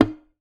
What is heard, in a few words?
Classical Guitar Extended Technique